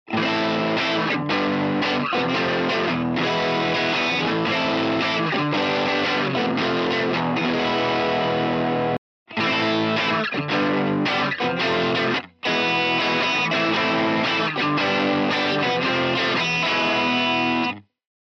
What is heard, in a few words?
jcm800 test